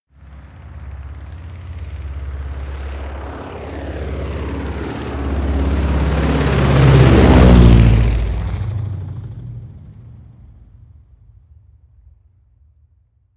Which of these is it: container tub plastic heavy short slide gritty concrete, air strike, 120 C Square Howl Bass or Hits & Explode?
air strike